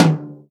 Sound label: tom, Real Drum Kit, jungle kit